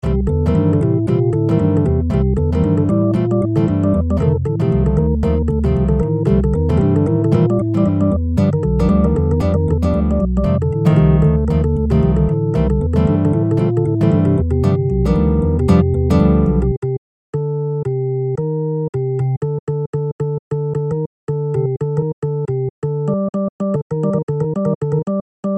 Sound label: MIDI ditti; spellman studio